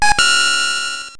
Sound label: menu prev